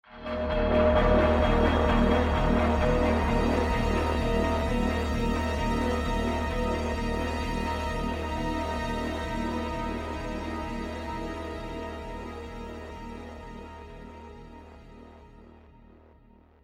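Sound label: Combat Stinger; State of Decay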